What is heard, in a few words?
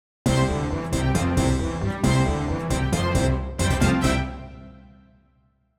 Jingle Music Only; Amendolas